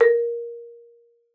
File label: MARM A#4, MARIMBA; Xsample VOL-4